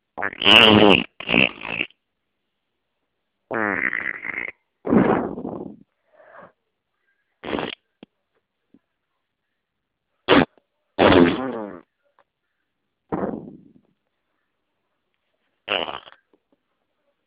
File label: Fart